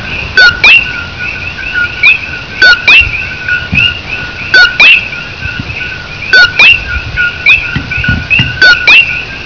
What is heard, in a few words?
1 frog, alien species in hawaii